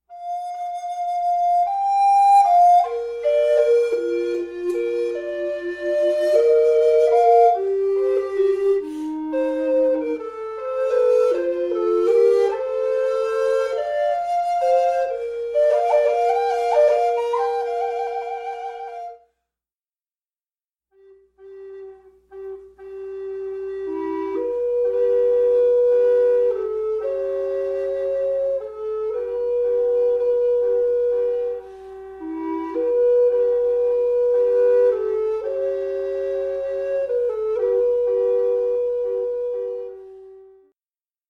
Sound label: Duet Recorder